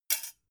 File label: dough hook drop